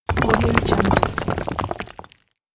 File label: stone wall breaking; sounddivision